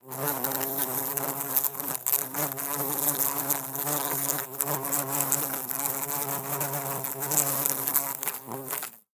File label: Flying Insects, buzz